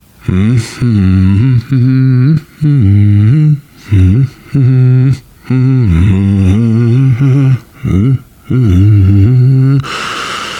nervous humming, survivor voice, biker

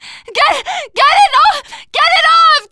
scream while pounced, teen girl; player; survivor; voice

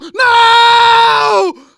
grabbed by smoker, survivor voice

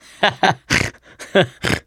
laughter, mechanic, survivor; voice

